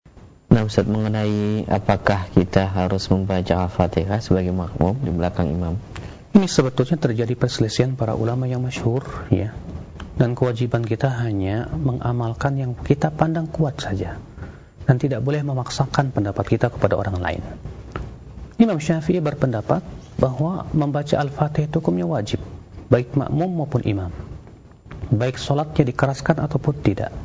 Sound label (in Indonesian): fatihah jamaah